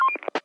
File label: Walkie Talkie Transmission Off